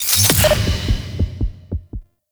Colonist Rescue Revive Boost; Warframe

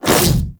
Infested Splitter Melee Swipe Small; Warframe; Grey Strain

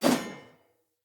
Player Sphere Polearm Whoosh; Weapons

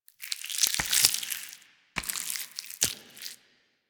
Taunt Flesh, Proto Infested Volatile Runner; Warframe

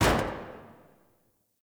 Parking Lot Car Door; Ambience; Warframe; Nineteen Ninety Nine

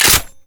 Uzi Reload; Tenno; Prime Tenno Uzi

